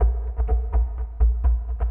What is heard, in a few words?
bottle, ethno, 125